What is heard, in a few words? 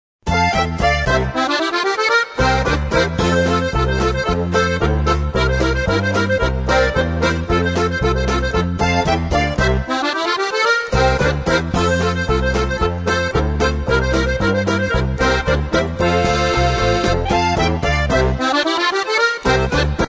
polka